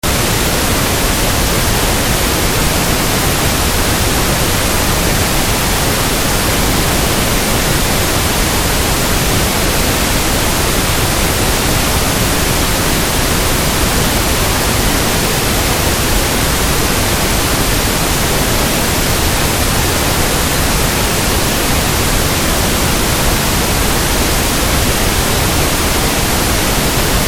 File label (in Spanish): Ruido Rosa centrado 5db; En caso de presencia de sonidos puros, el nivel sonoro equivalente se incrementará en 5dBA; Los sonidos de tonalidad marcada son más molestos que los que no tienen